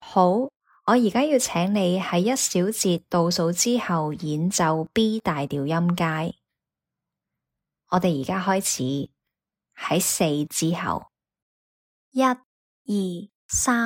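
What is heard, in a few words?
B major scale E flat major scale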